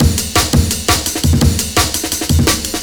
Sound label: classic amen